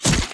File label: Melee Hit